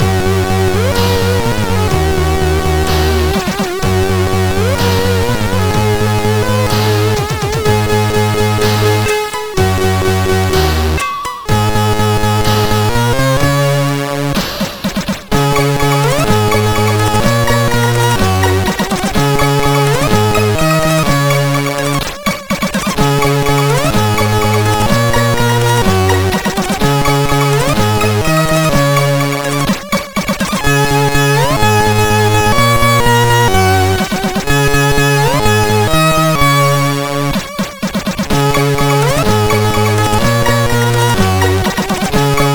Funny Music; CSG8580R5

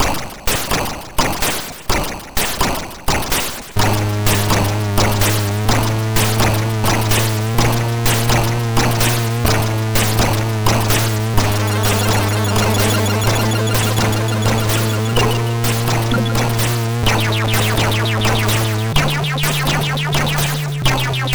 Second Sound, sid MOS6581R2

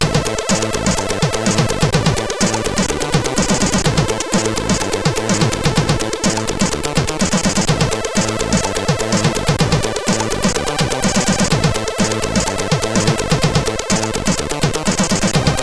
Disco, CSG8580R5; hvsc

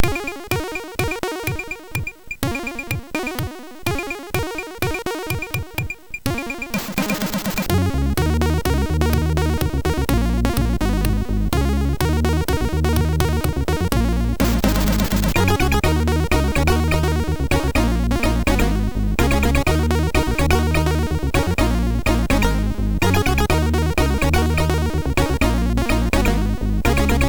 sid MOS6581R4; Lethargy Rasters

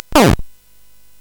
Frantic, CSG8580R5

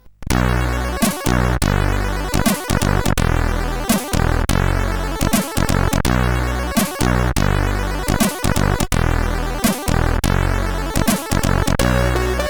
sid CSG8580R5; Wendy

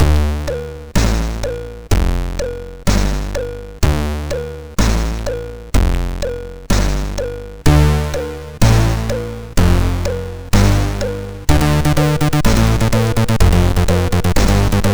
Funky Rasta, MOS6581R2

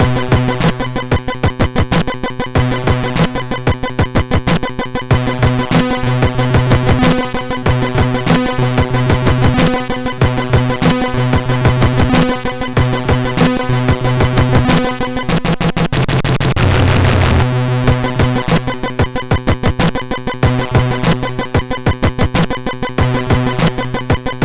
Keyboarder tune, sid MOS6581R3

